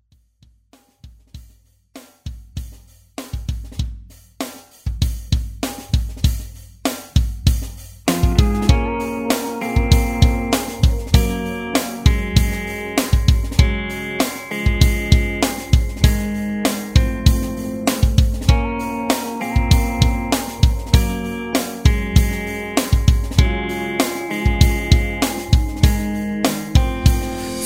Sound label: Country Redrum